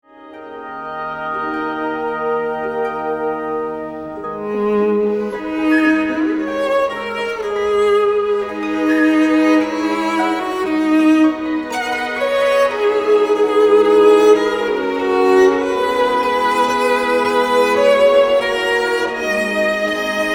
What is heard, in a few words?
FIRST MOVEMENT